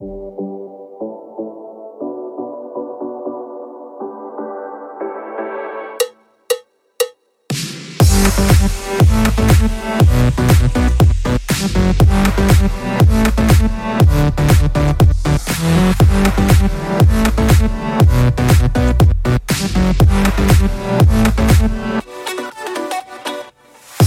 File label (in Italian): BASE MUSICALE DANCE